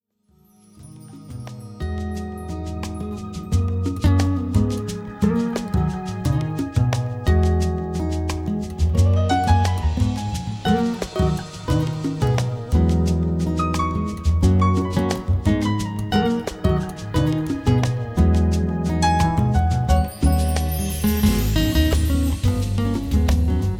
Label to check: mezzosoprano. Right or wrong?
Wrong